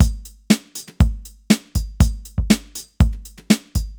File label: beat parametric eq; nf retro film; fruity parametric eq